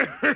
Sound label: hit demon